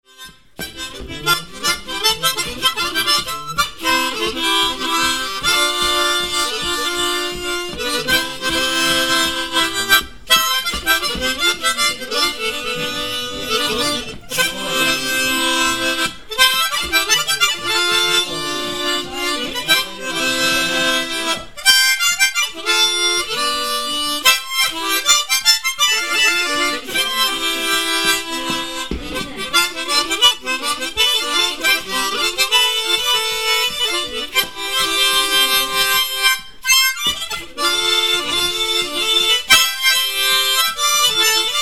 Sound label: mouth organ fast